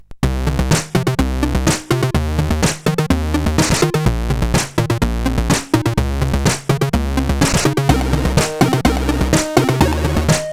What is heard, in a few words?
sid CSG8580; swamped